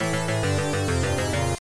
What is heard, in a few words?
Game Over; Golden Axe